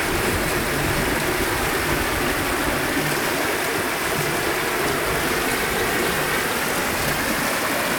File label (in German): Bachrauschen Filsquelle